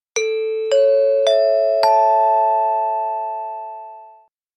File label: ding dong ding dong